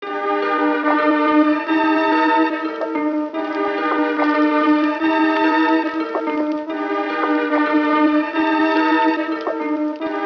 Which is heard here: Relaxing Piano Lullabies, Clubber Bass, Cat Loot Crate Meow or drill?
drill